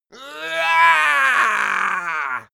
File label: goblin attack